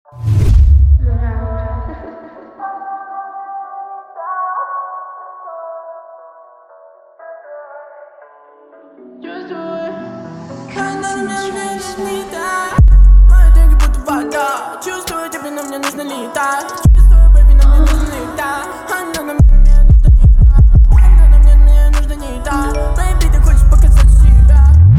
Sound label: SLOW BASS BOOST